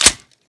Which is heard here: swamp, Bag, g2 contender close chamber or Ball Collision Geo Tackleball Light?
g2 contender close chamber